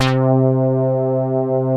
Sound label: ANA KEYS; Pro-Rec - Synthsonic; JV ANALOG1